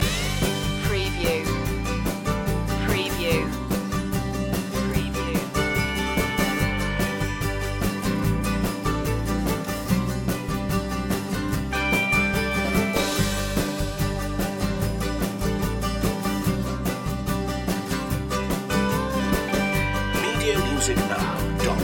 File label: mandolin